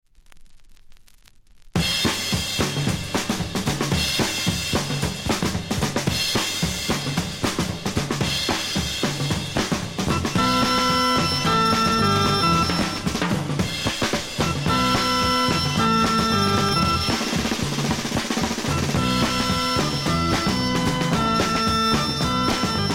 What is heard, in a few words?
drums